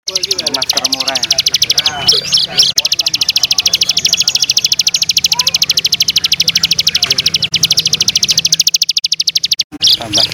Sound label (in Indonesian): suara burung ciblek gunung nembak panjang